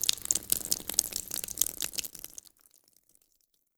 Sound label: Blood Drips